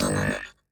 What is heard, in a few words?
challenge fail; coup